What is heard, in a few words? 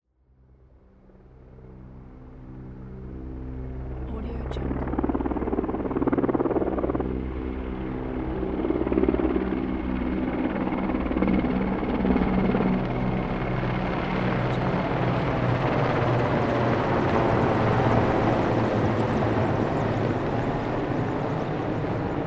three military helicopters flying by